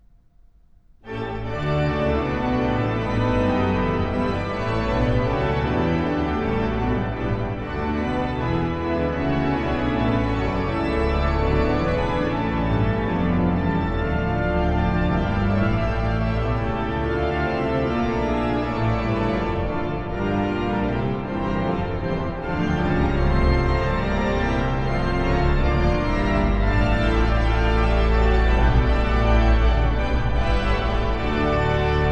Pedal Trombone

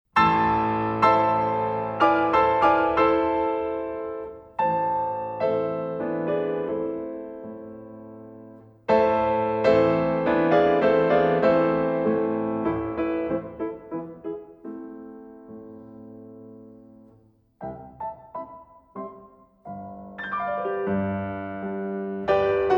improvisation

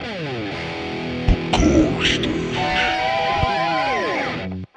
Guitar

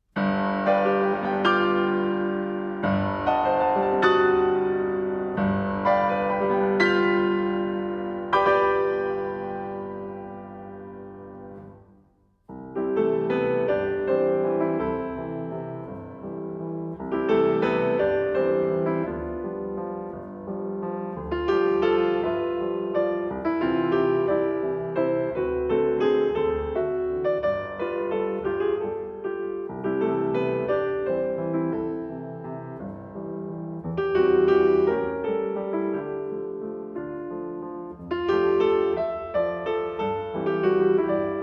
waltz

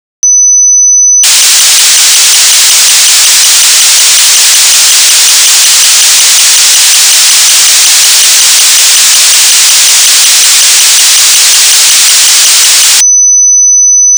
chainsaw

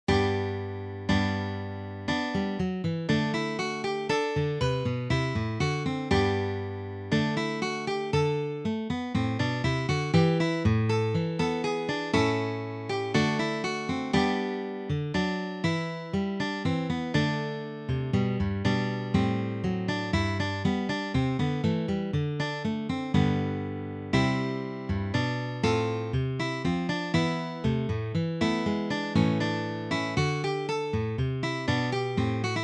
Accompaniment

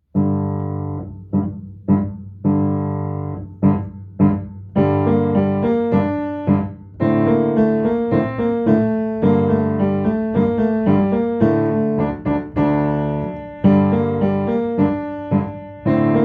Distant Drums

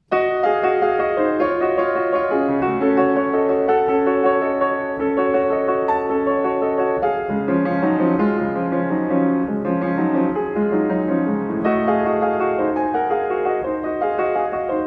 etude for left hand

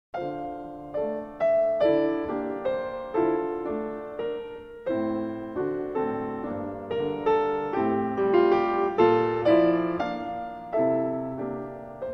lullaby

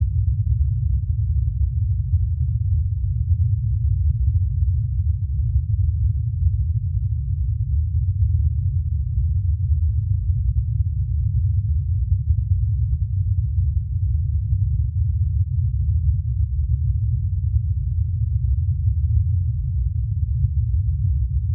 Band Limited Pink Noise 60Hz 120Hz

